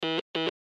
Morse Code I Letter; Blastwave

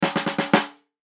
Drum Snare; Snare 14 Inch Pacific Hit Multiple Fast; Drum Kit Sound Effects

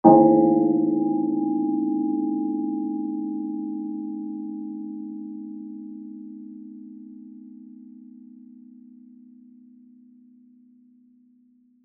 Church Bell Ring, Music, Church, Bell, Ring; Bells; Musical

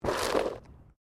Body movement Slide Gravel; Body movement foley to simulate falls and deaths; Bodies; Human

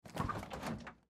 Refrigerator Door, Refrigerator Door Open; Household Refrigerator Door Open Appliance; Refrigerator Doors; Blastwave

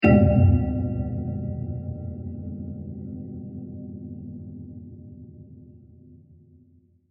Anvil Impact With Distinctive Reverb; Impacts; Blasts

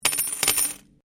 Coin Penny Multiple Drop Laminate; Common coins; Money; Objects

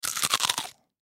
Human Bite Food; Bite Food Apple Food Eat Crunch Rip Tear; Eating

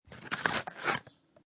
Paper Box Or Cardboard Box Open; Paper (Sound Effects:Materials)

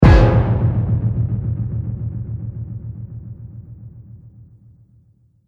Trailer Hit Energy Generator; Trailer Sound Effects

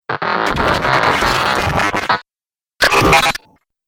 Dubstep Scifi Effects

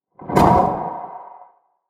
Ye Olde Magic Whoosh; Whoosh Sound Effects

